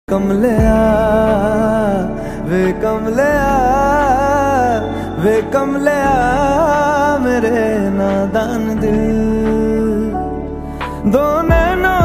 ringtone sad song ringtone

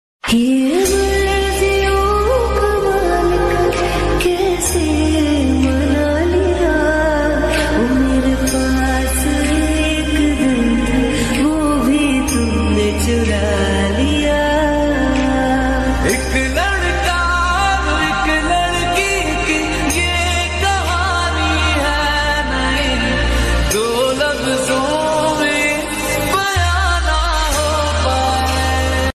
love songs ringtone

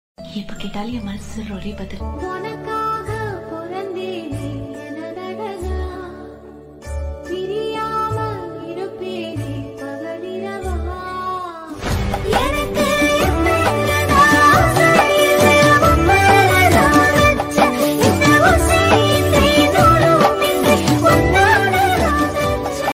tamil love female version

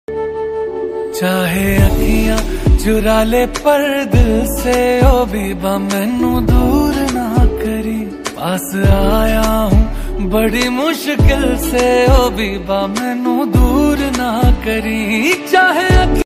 mobile phone song mix music ringtone

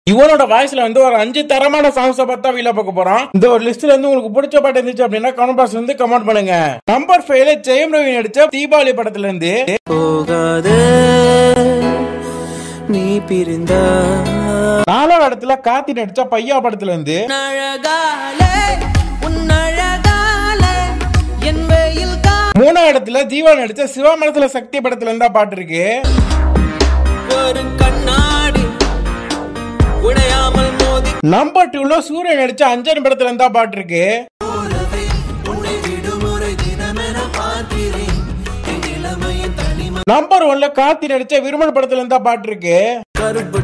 singing songs, tamil

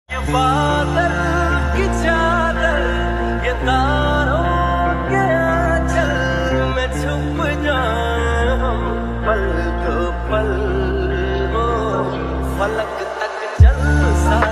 ringtone lofi remix

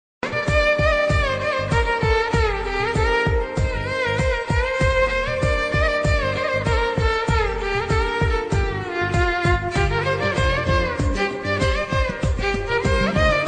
violin bgm; kirrak party

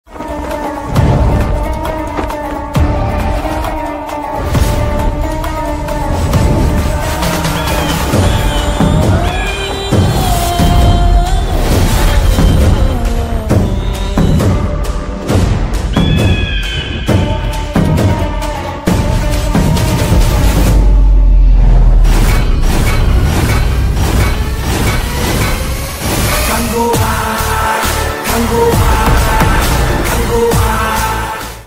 Title Announcement Bgm Ringtone; kanguva; suriya 42

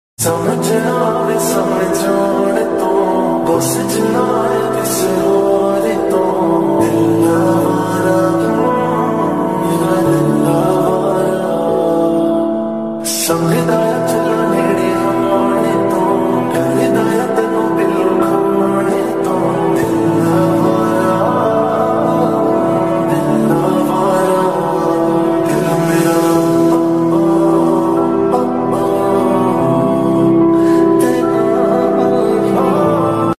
ringtone slowed reverb lofi ringtone

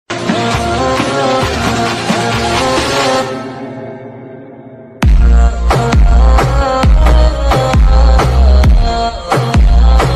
english bgm ringtone

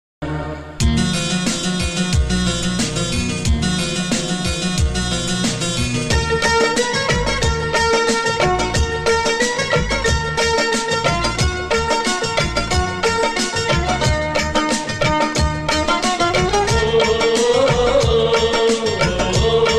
music only

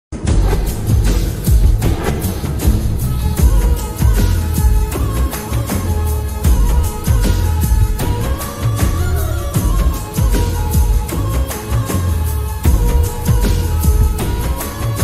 instrumental ringingtone

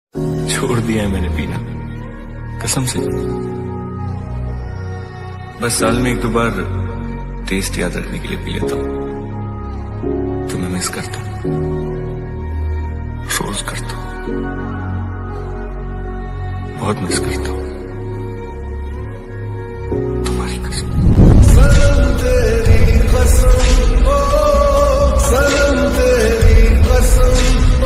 lofi

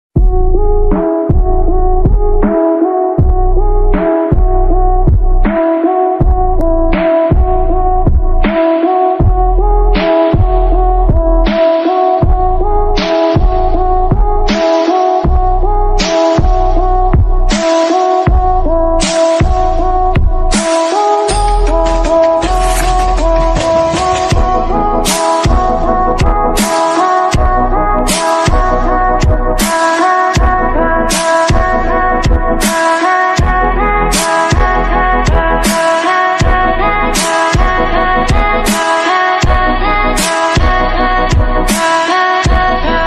slowed tiktok remix ringtone

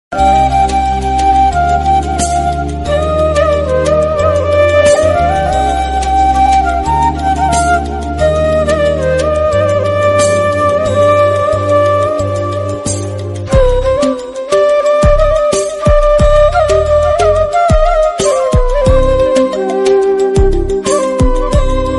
song flute music bgm love ringtone; surya

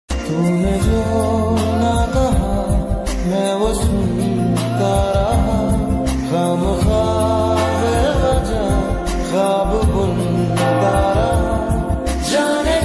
sad song ringtone

